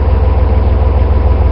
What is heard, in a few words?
car, bus; sven; russian sounds